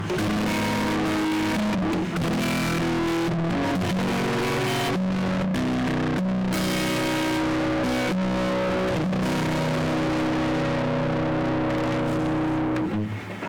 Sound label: acoustic 808 Parallel; AMEK